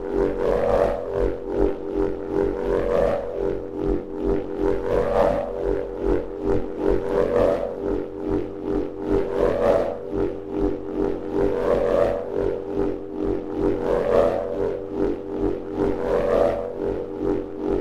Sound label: Wind Wand Drone - Pulse, Ethnic Percussion; Sonic Foundry (Sony Creative Software); Ambient Atmospheres & Rhythms